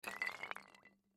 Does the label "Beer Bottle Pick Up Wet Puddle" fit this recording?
Yes